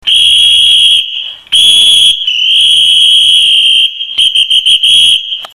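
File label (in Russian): свисток милиционера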